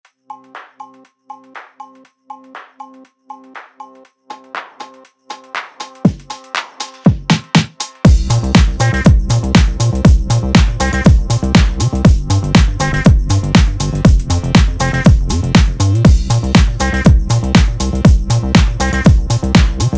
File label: Energy minimal dance